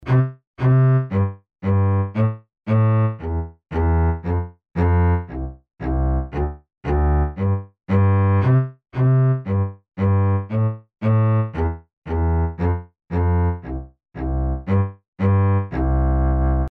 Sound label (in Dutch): Contrabas